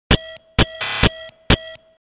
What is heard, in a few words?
Cyber Sms